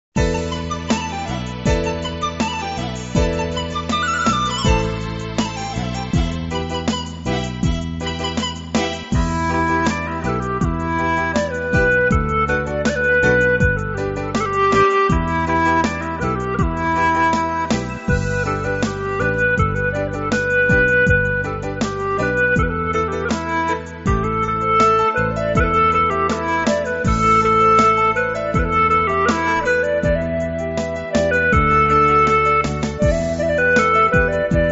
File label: yunnan folk music; minzokusyasin